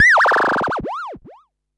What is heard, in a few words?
Perc Synth Raw